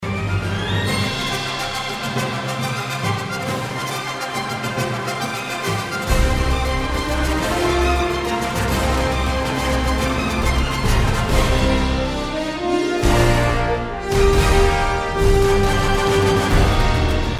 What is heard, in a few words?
Solo